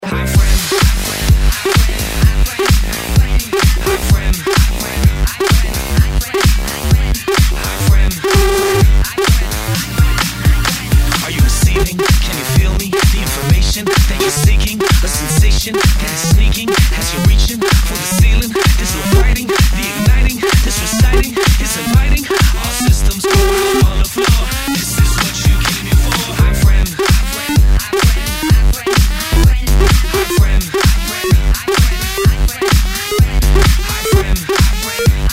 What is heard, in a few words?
Melody, RingTune